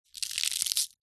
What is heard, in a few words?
animals insect cockroach crush squash crunch